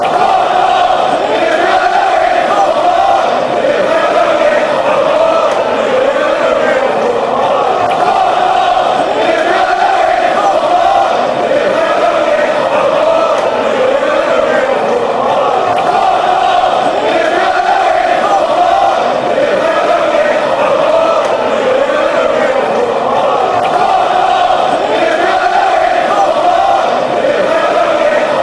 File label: win all, fanchants